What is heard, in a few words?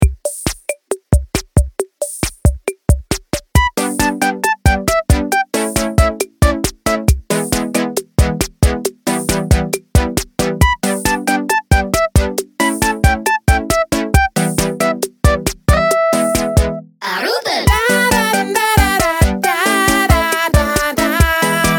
jingle